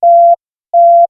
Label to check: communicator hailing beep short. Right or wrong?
Right